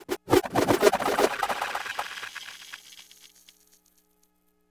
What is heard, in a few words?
Magic, Whoosh, Delays, Sizzle